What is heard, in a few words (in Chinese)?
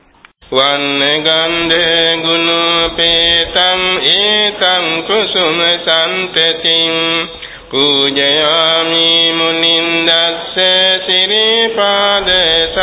供花, 课诵; 早课; 龙树林; TheravadaDatabase